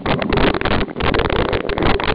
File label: fry; WalkerII